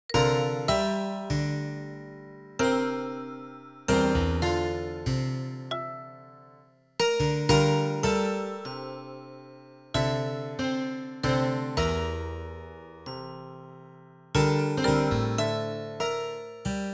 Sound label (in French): Percu Keybo